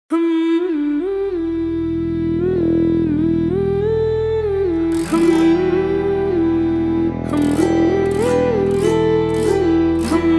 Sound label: Forbidden Forest Grooves, drum samples